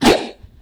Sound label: throw; midnight guns; crt male